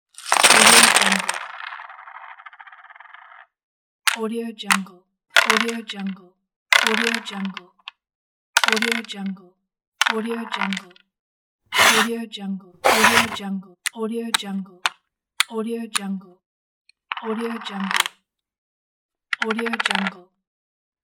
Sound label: backgammon game; backgammon game pack; amethistlab